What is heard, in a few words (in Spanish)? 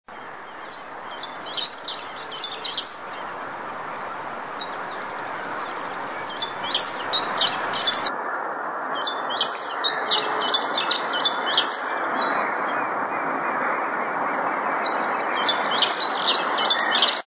verdón, voz